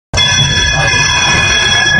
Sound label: tram bell long